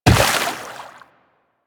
Run Water, Footsteps; DE; Warframe; Tenno

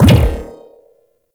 shock rifle projectile bounce

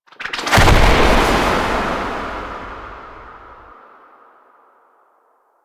End Burst; Cinematics; Stalker; Warframe; Orbiter Cradle

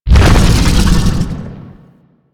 Biped Vocal Death; Warframe; Man In The Wall

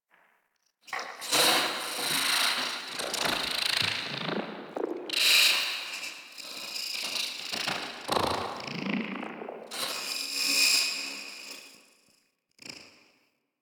Tech One Off, Ambience; Warframe; Sacrifice; Vitruvian Memory

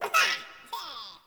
Sprout Vocal Surprised

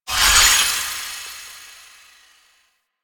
Bleeding Shards Cast; Warframe; Citrine

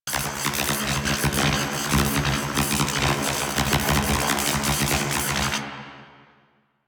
Prism Spin Loop; Enemies; Abilities; Arachnoid Wraith; Warframe; Corpus

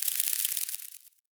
wetland forest day insect close; Ambience

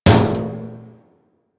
Physics Barrel Impact Medium, Metal Barrel

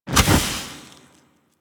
Fire Stop, Flame Thrower; Weapons; Warframe; Ignis Wraith

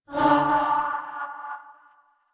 Cast; Warframe; Bard

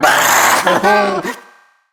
Treasure Box Pain; Warframe

